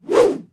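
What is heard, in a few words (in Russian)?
завораживающий звук замаха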